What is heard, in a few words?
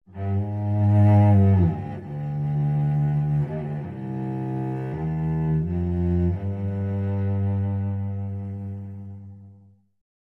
Melancholy Cello